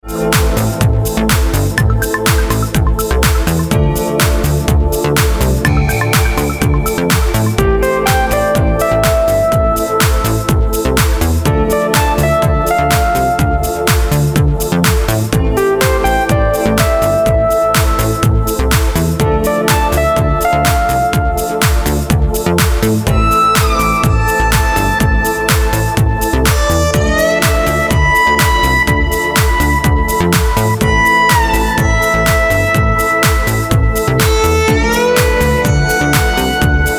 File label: Atmo Pad